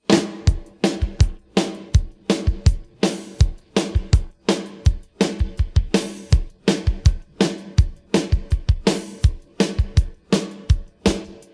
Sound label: pattern, drums